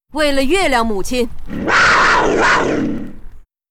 Huntress war cry, zh

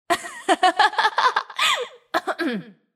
laugh, zh

